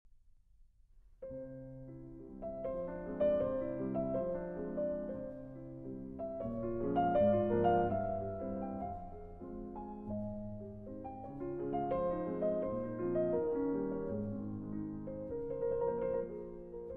Canzona Serenata in F minor Moderato

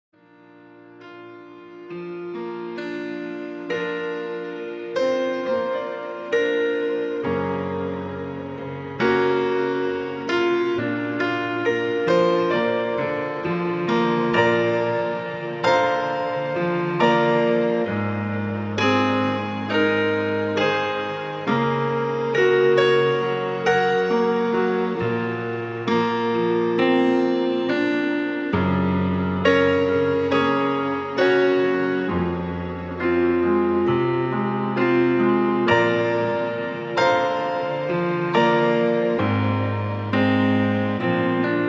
Relaxed Piano Music, Emotional Release

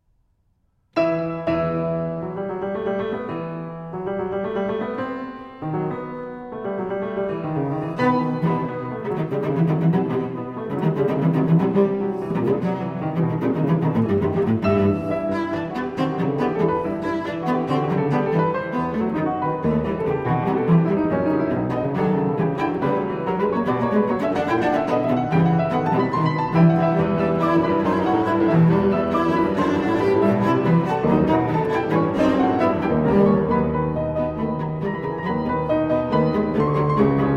Cello Sonata, Allegro; No. 1 in E Minor, Op. 38 III.

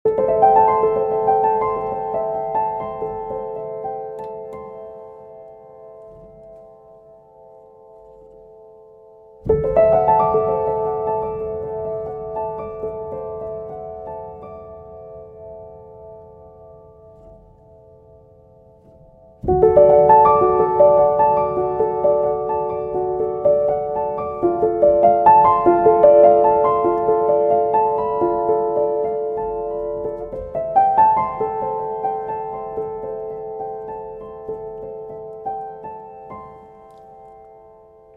Solo Piano

